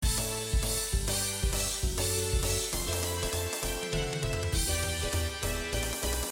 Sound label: quieter party music loop